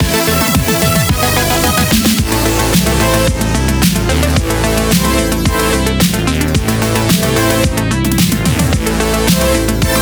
Boss Loop

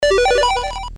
enter bonus; Sigi A Fart for Melusina

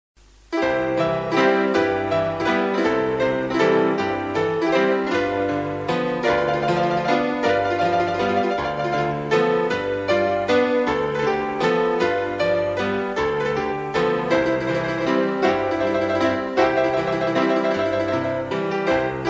Saloon Piano; Red Dead Revolver; ps2; Bar Fight Intro

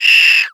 Ant dies squeel; Blastmorph Pinball; xbox series xs

